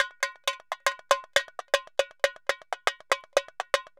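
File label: tamborim, DRUMS SAMBA; Best Service ProSamples vol.14; AKAI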